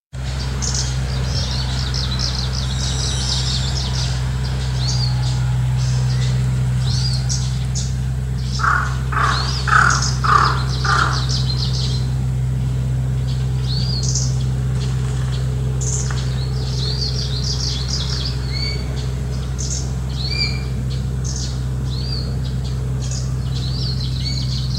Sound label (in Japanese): oomushikui